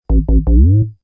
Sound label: low three tone; kenney digitalaudio